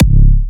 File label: Hitter C#4, Kicks, Big Room Kicks